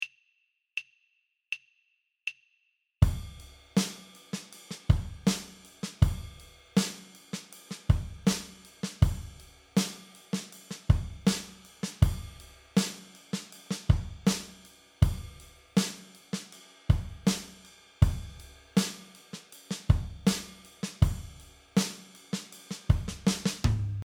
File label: Backing track in 44 time 80 bpm, guitar; 4/4 time signature (80 bpm); *bpm = beats per minute